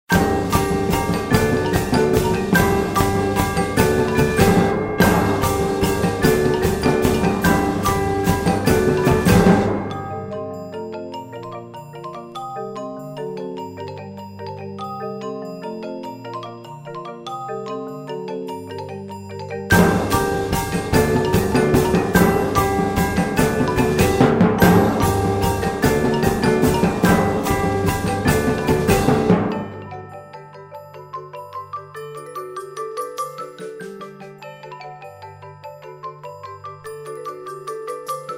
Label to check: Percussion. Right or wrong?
Right